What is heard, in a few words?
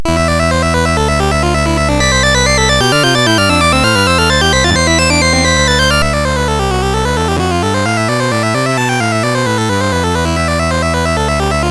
Fugue, MOS6581R3